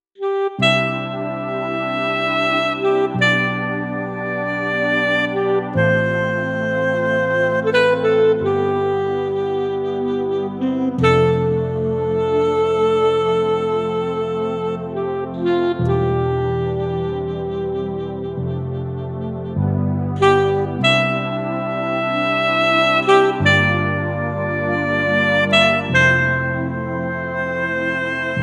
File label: Brass; dexibell; vivo s8